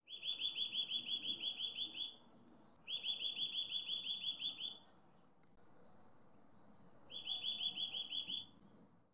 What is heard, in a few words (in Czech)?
hlas zvuky zvirat